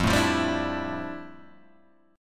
F Major 13th, chord; strummed

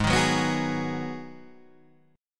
G sharp Minor 7th, Guitar Half Step Down

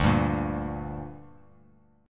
D Suspended 4th Sharp 5th D down, chord; strummed

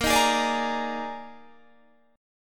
Eb Major 7th Suspended 4th Bb

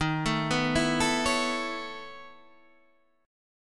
B 11th Eb, chord

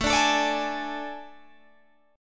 A Major 7th Suspended 4th, Guitar, Open G

